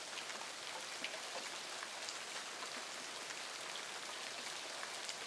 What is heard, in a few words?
rain